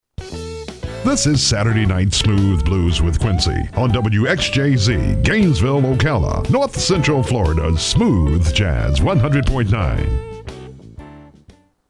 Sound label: Smooth Blues ID